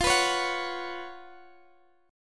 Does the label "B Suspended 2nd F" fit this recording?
Yes